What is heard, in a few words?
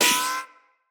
Bullet Impact Ricochet